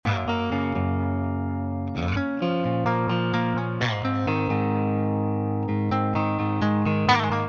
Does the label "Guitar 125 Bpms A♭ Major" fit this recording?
Yes